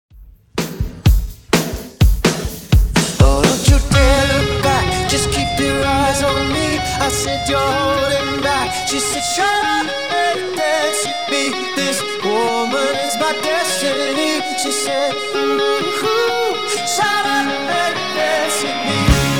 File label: Epic Intro Redrum 126 Bpm